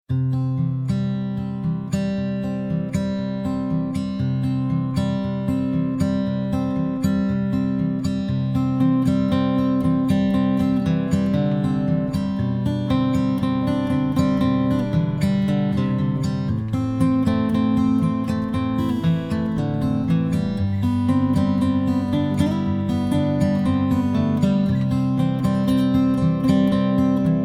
Guitar; Guitarra-Cuerda-Piano-Ambiente

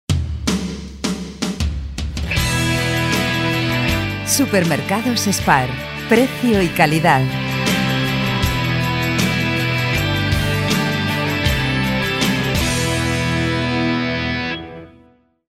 JINGLE; SPAR

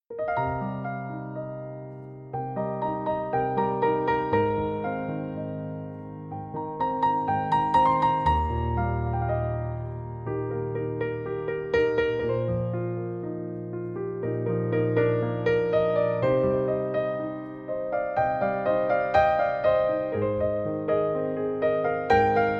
Siren Beat Piano Version

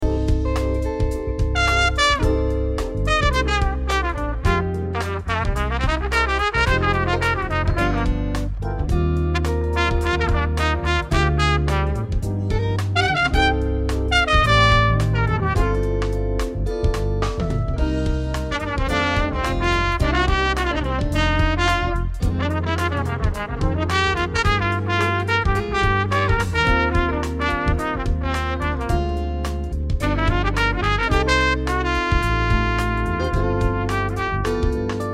Smooth Jazz

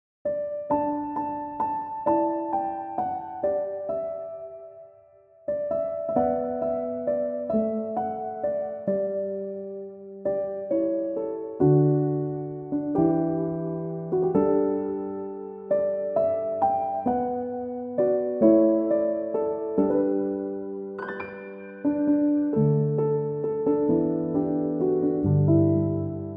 chill piano instrumental

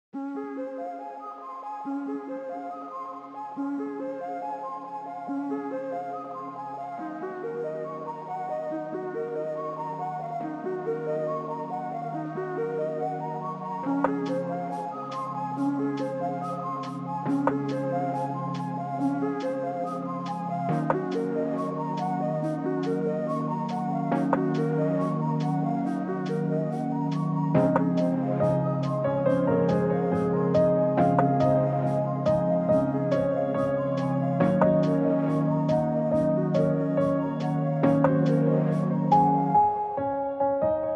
lofi instrumental

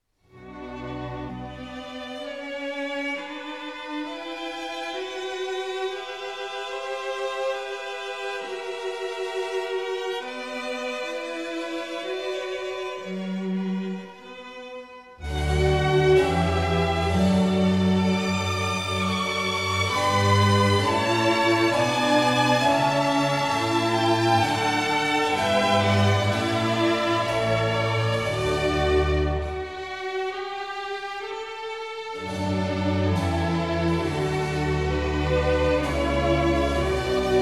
Concerto Grosso No 5 In D; Feuerwerksmusik, 3 Concerti Grosso; Concerto Grosso No 5 In D Major

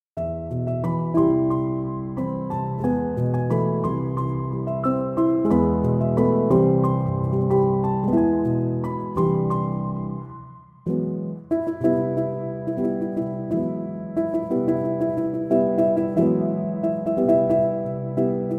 Piano Instrumental

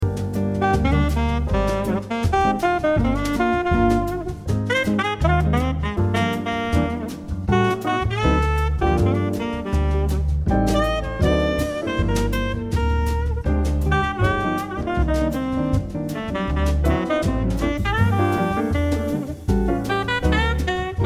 Musica Jazz Instrumental

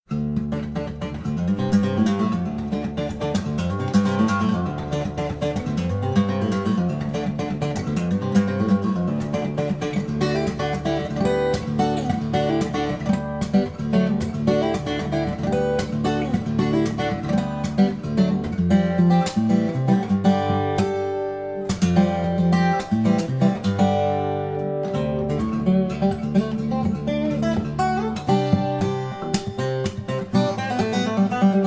Amazing Chill Guitar; CHILL OUT SUAVE

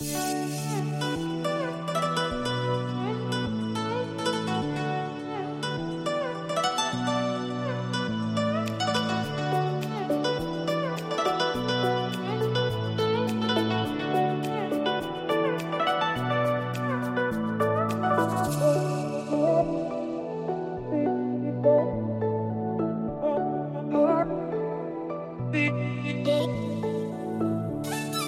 Acoustic Waves

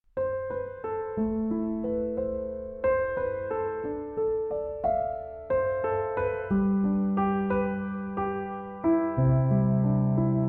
piano instrumental

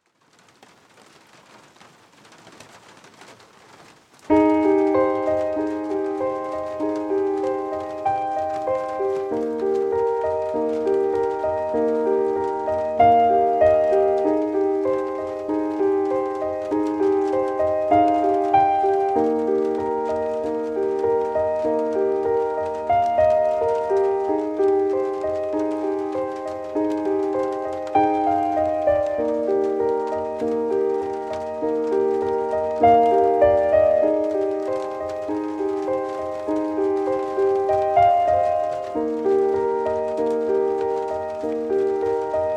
Relaxing Rain Song (Rain On The Roof)

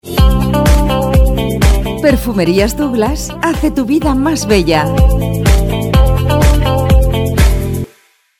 Jingle; Perfumerias Douglas

